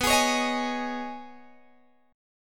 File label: B 6th Add 9th B down Guitar Open G